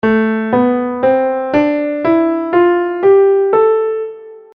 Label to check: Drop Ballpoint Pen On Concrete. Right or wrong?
Wrong